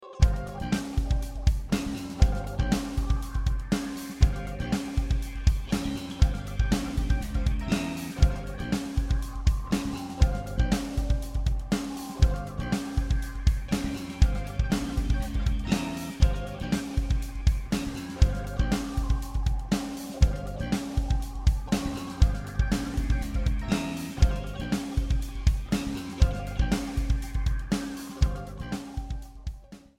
G Dorian b5 Jam Track; harmonic major modes; jam tracks volume four

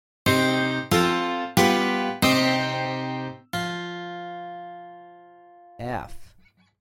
F2 12 String Minor; ear training instrumental color

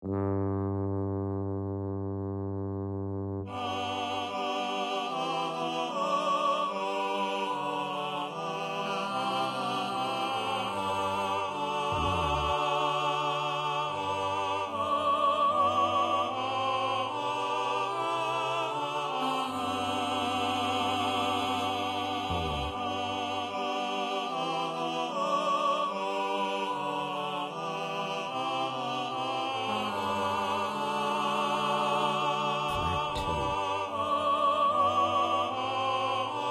Chorale Voice All Voices; Bach Chorale 1; classical ear training

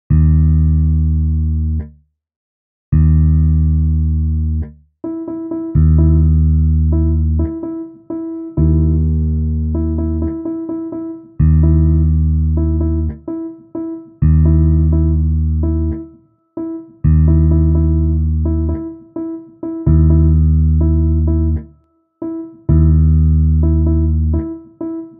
Eighth Note Triplet Rests Eb Drone; reading notes rhythms plus ear training

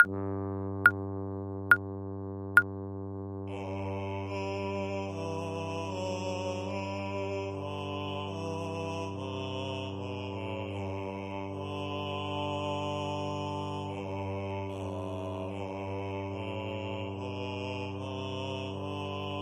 Voice Bass Drone; Bach Chorale 1; classical ear training

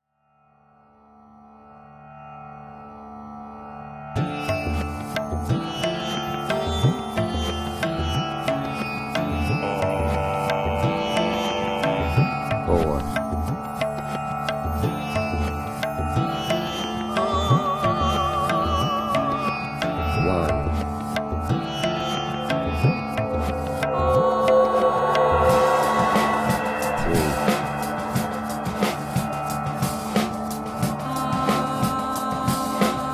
Key of D Short; real world music ear training

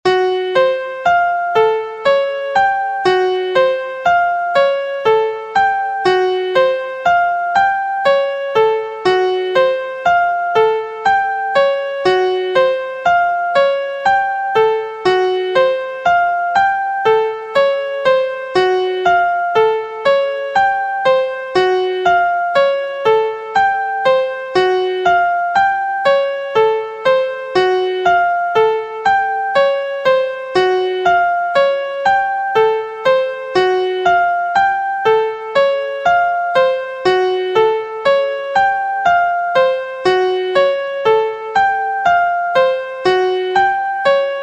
Degree 1 b2 2 3 5 b6 1st Inversion Key F; harmonic and melodic equivalence; trichord pair

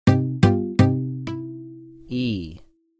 E3 Acc Steel Muted Guitar Minor; ear training instrumental color

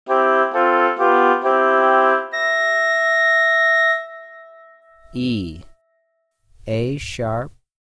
E4 A#6 Oboe 2 Note Stereo; Level Three “Stereo” Example; ear training two note

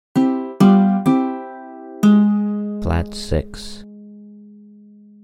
Flat6 Mandolin Major Degree Name; ear training instrumental color

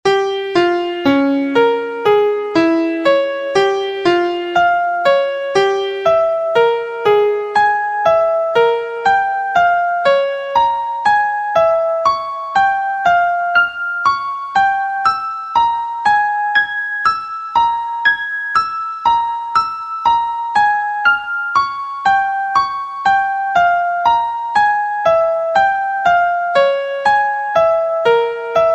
Degree 1 b3 3 b5 5 6 Atomic Scale Key Db; harmonic and melodic equivalence; two triad pair

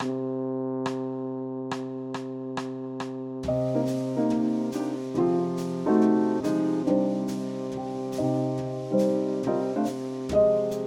C minor blues no bass tenor drone; applied jazz ear training; Practice Perfect

